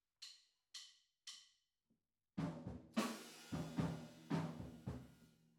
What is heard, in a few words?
TB GTR